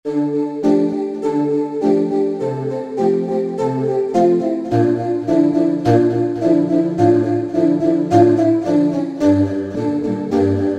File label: Choral, Roland V-Synth; Factory Patches; 153 Choral Beam